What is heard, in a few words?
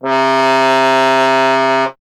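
bass trombone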